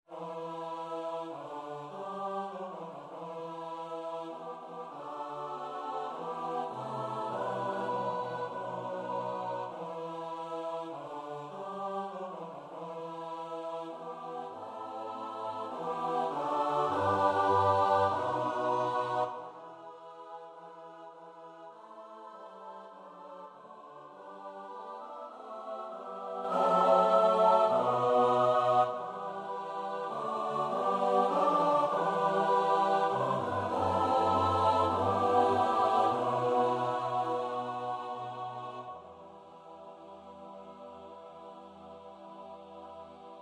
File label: midi; nowowiejski; msza polska bogu rodzica introit